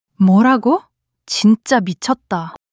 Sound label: surprise